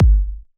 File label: Muay Kick